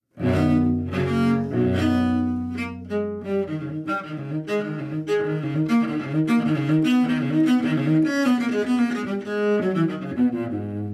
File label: Contrabass; Assassin's Creed Syndicate